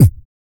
HICCUP; EURO HICCUP; Spectrasonics; Vocal Planet; Blues Soul

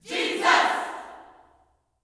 JESUS, Gospel, YELL SHOUT; Spectrasonics; Vocal Planet

